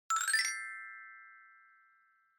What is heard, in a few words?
Glocken Low Long